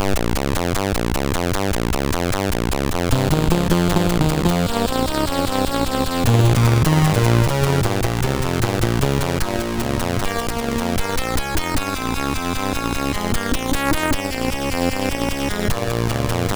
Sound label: sid MOS6581R2; Shadowfire